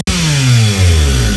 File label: chainsaw end